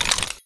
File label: belt, weapons; garrysmod; mg vollmer